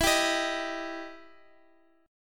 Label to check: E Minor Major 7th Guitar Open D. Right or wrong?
Right